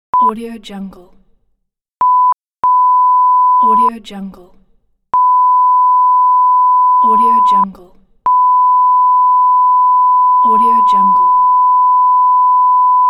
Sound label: censore beep pack